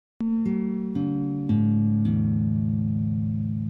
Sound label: Guitar